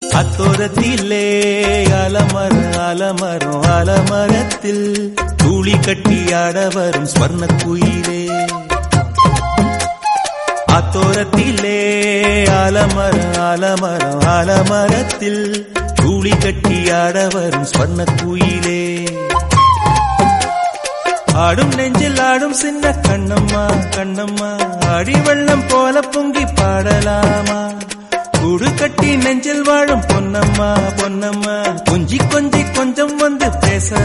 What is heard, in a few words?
Tamil Song Ringtone; Kaasi